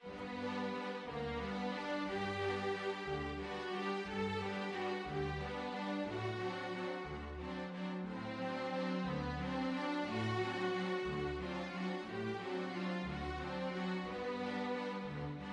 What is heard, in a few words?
rosas sobre las olas, string ensemble